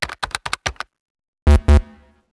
security access denied; asgard

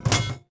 pipe hit metal; objects; Star Wars Obi-Wan; XBOX

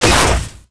flesh impact, baton; weapons

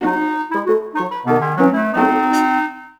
Expectant

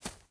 footsteps grass

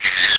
monkey hit; Pirates Of The Caribbean; DUEL

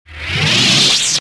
saber off; weapons

